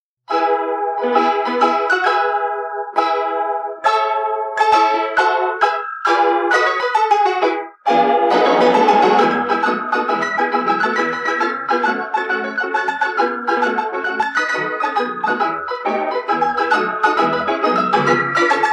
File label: Wurlitzer IX Nickelodeon